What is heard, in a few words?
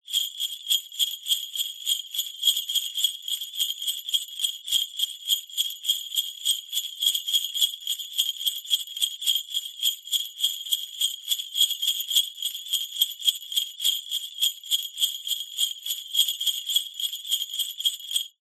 musical large sleigh bells med shake